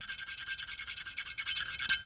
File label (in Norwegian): skrap fort